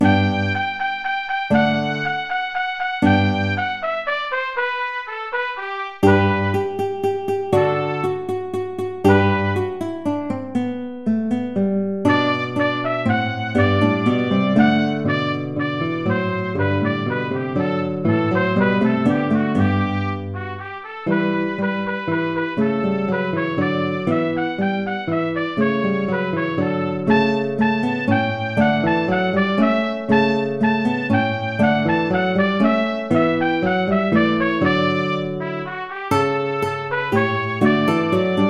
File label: old hungarian dance